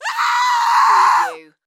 Scream Female

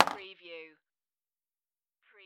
Pinball sound, ball drops

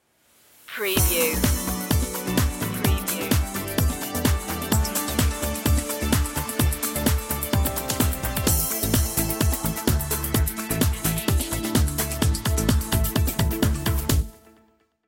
positive uplifting

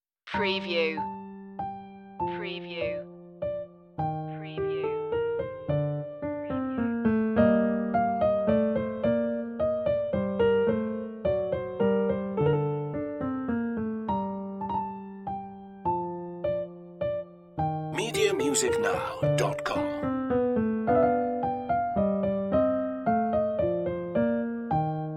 minuet g minor; petzold; bwv anh115

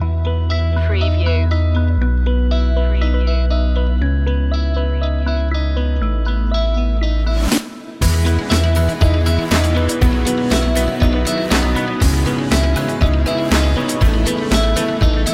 uplifting and motivational loop

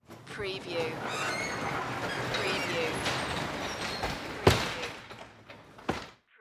Garage Door Close, Manual

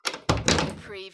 Wooden Door Close; Sounds of doors and windows opening and closing, keys locking / unlocking doors

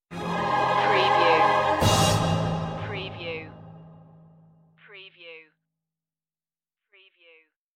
voices on the wind stinger

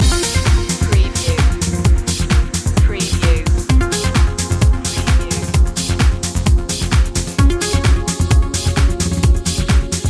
plasma life loop

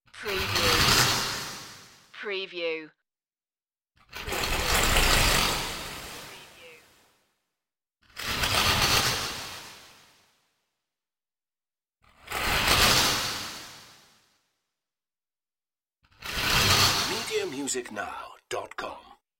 space anomaly sizzle

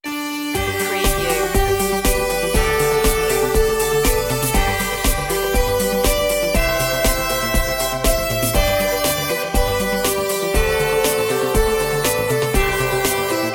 auld lang syne synthified

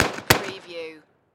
Pistol 45 Magnum

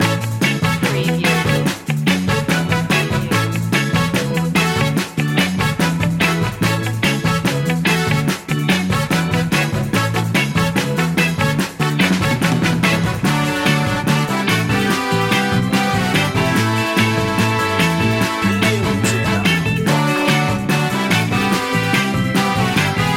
give me one time loop

